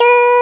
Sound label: TONE A2